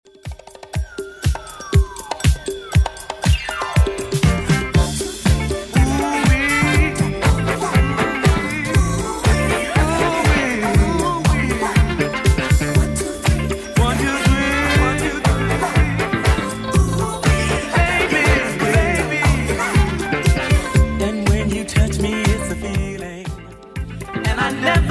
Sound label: 80s Redrum 120 bpm CLEAN